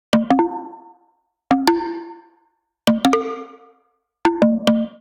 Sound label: Drums Percs 175 bpm; MIDI Clips; Ableton; Factory Packs; Skitter and Step; Jump